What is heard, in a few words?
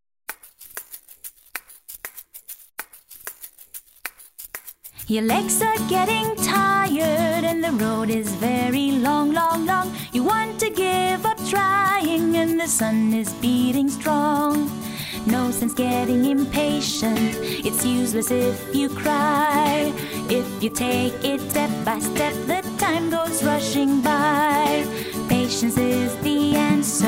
Song, EN